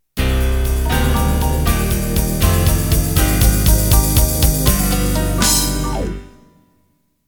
6/8 12/8 Ballad Intro

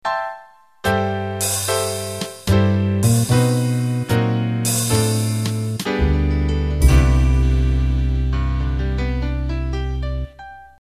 Big Band Ballad End

